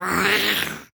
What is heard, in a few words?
Zombie